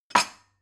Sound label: glass shelve